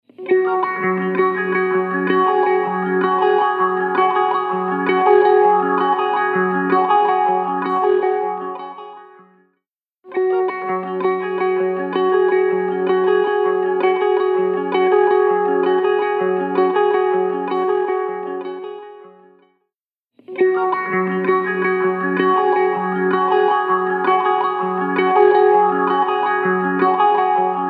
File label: Clockworks